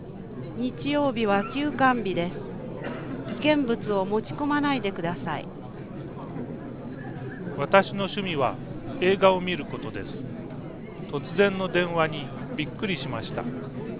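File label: lobby b, noise canceller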